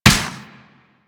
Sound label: Space Ship Turret Fire; Warframe; Grineer Pursuit Space Ship